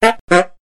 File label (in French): pouet pouet